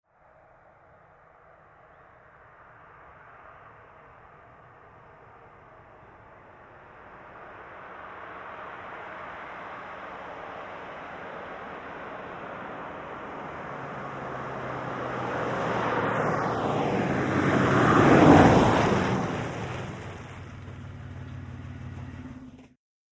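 Montero App Fast Off, 1998 mitsubishi montero ext.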